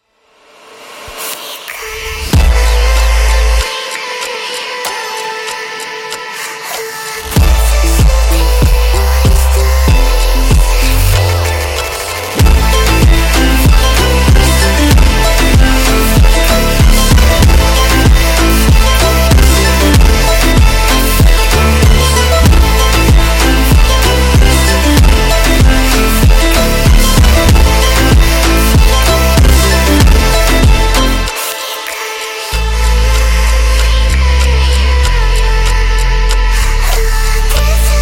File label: Funk sped Up, funk